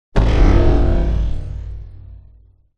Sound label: fully charged presence emerging deep dark impact future; alexander gastrell